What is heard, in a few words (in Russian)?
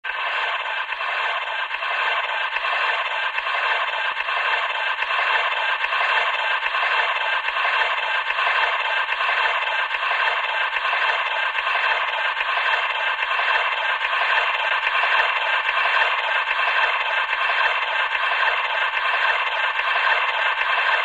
skrip plastinki v konce zapisi